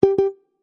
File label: new chat; jappix